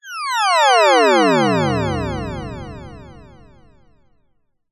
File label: science fiction retro teleportation beam; zapsplat